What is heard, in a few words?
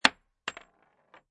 Bullet Shell Drop Machine Gun Solid Wood; Machine gun empty shells fall on solid wood; Lynne Music